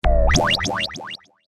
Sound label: Transmission Burst, Scifi, Transmission, Burst, Science, Fiction; Communication Sound Effects; BlastWave